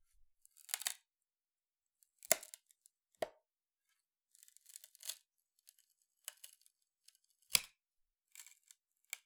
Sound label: Palette, WoodenPalette